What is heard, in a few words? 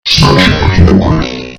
smashing the keyboard